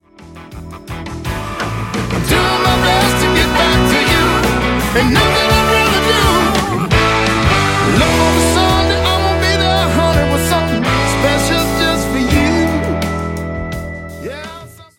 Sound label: Boxcar Blues